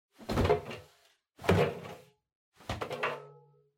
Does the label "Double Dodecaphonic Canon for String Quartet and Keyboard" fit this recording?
No